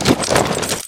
zipline hit ground; npc; source engine; episodic; combine soldier

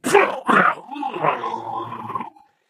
pain wall crash; player

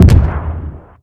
underwater explode, weapons; Source Engine; video game

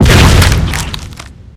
pound victim, tank hit; Left 4 Dead; Source Engine

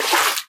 water wade, footsteps

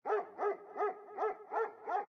dog; Source Engine; tf

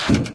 ammo crate open; Source Engine; video game

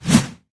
claw miss, infected

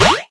space exclamation; windows 9x; sound schemes

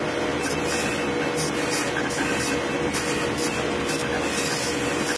command center blue, ambient; Source Engine; tf

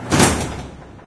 elevator arrive

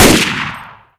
rifle fire; weapons; gunfire; source engine; left4dead2

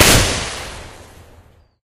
flaregun shoot; tf

